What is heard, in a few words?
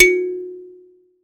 Finger, Prepared Kit; Battery 3 Sample Kits